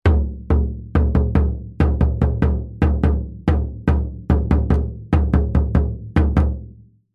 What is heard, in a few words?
musical drum tom hit strike short pattern rhythm football chant